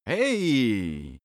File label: male cheer mildly "hey"; koerpergeraeusche; menschen; soundpool